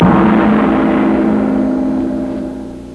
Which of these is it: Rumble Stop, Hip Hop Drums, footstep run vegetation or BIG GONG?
BIG GONG